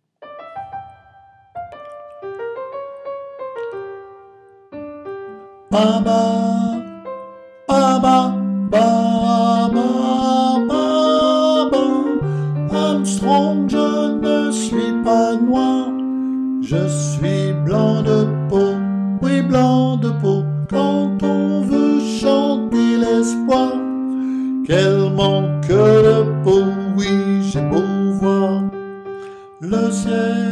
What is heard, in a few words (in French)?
tenor VOIX; "Go down Moses"